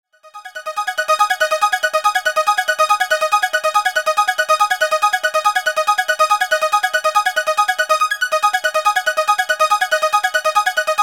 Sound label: Bed Synth Sequence, Bed Sweeper Swirls; Bed Sound Effects; Blastwave; Production Element Imaging Element Accent Transition